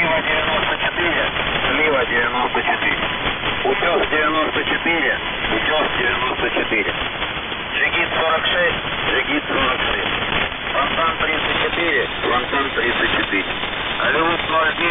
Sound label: squeaky wheel message louder